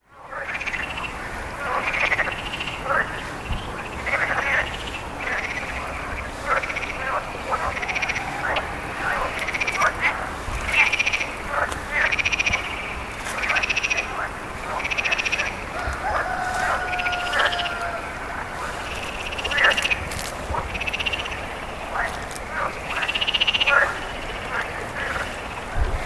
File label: Frogs; Turquie; Trukish